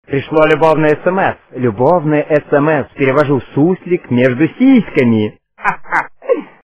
sms ringtone

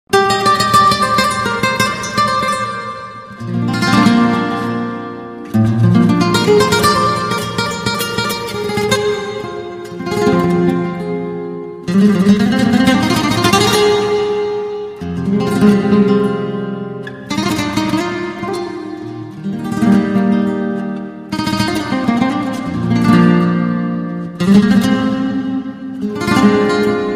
spanish guitar

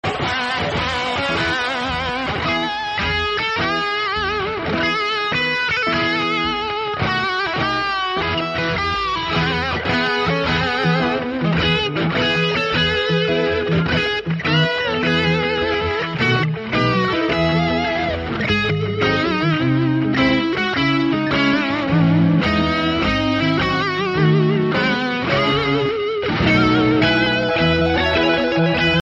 italian anthem

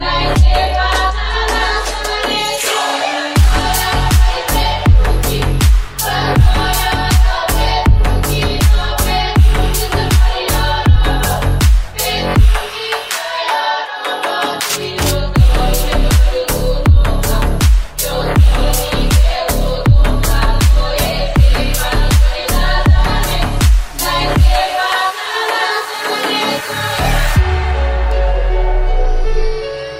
bass remix ringtone

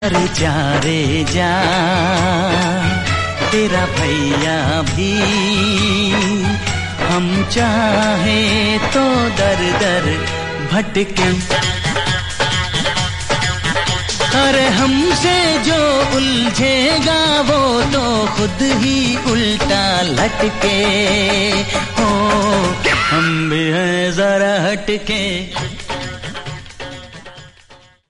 old song ringtone

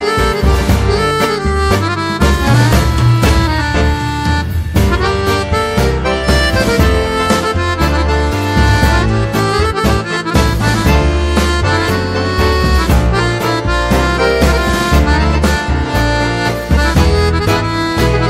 argentina classical; ringtone

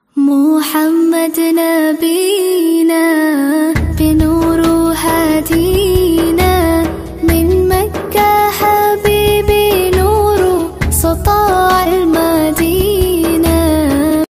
popular naat ringtone

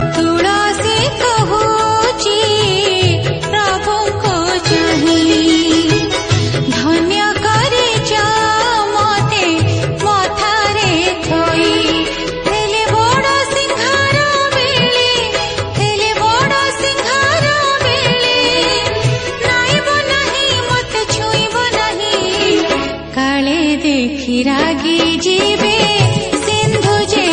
oriya bhajana

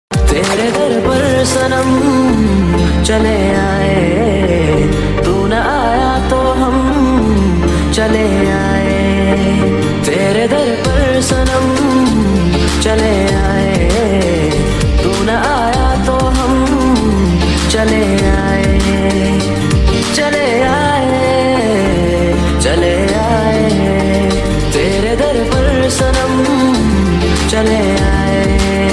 unplugged cover

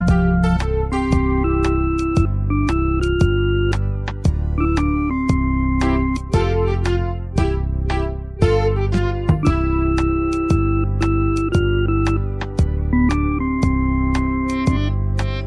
new danish country ringtone

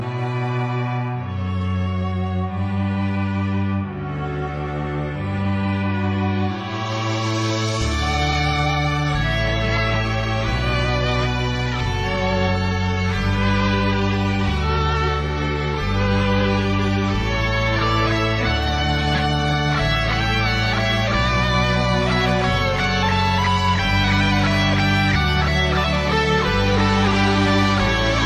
rock; cannon

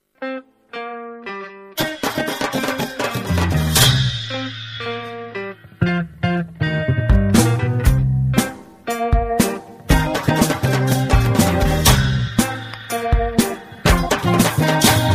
nepali folk with newari betal

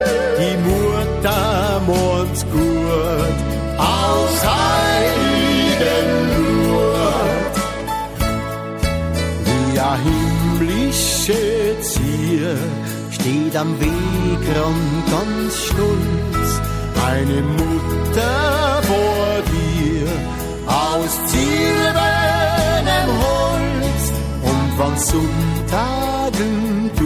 austria austrian alps

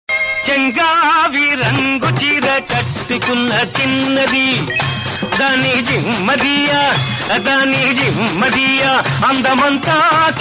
telugu old hit; ringtone

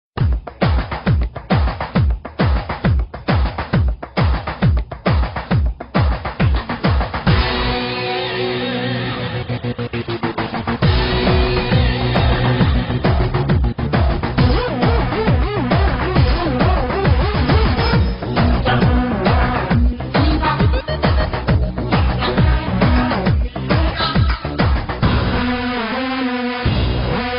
flute; makkhi

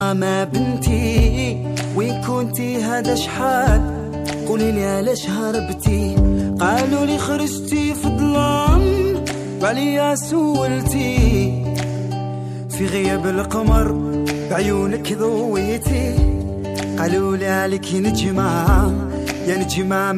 moroccan song ringtone

